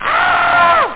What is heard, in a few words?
enemy death